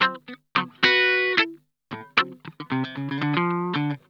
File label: GROOVIN, Funk Guitar; Zero G - Funk Guitar